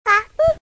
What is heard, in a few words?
cracker